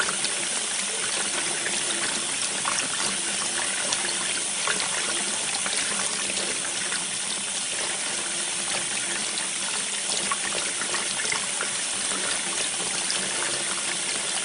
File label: water